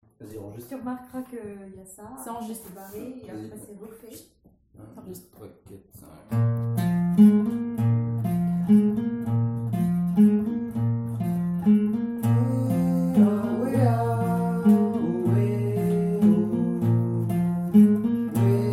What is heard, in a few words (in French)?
basse 1 et 2 et accords; AUJON